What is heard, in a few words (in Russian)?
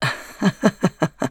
Смех; Симметра